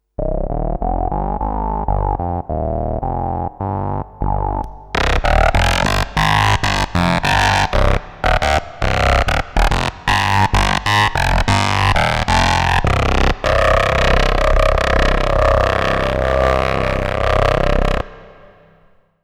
waveshaper 5 lfos, interpolating scanner and scanvib, synth; jurgen Haible